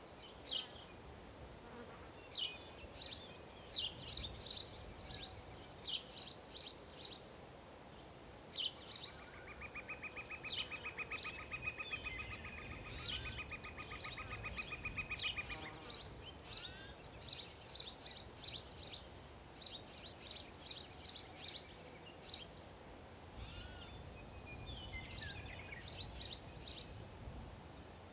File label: Forest Woodland Loop; golf story switch gamerip 2017